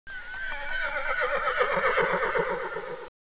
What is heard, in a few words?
western distant neigh; ambience